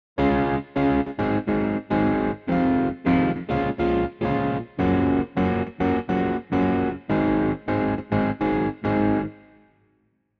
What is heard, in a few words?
E Guitar